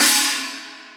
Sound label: Drum Crash Cymbals